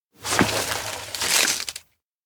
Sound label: box take paper open; koyomi; anemoi